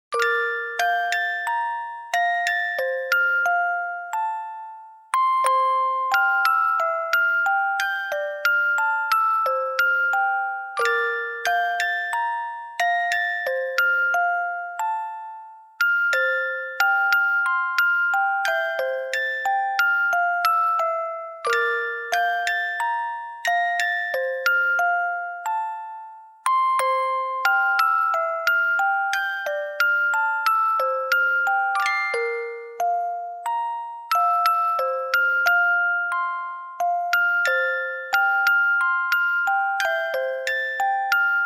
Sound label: music box arrange